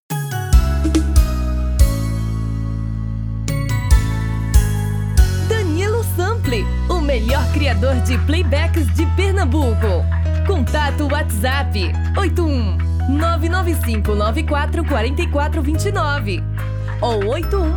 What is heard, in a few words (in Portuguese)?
brega